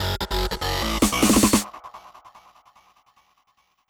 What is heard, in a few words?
melody